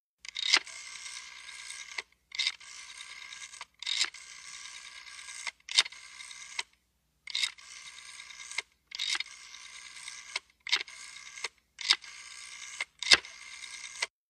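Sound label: British Desk Telephone Dial, pulse rotary dialing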